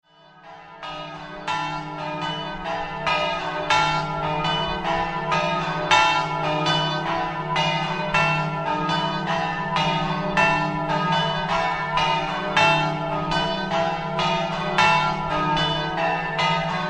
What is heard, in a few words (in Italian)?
campane; FLUMIGNANO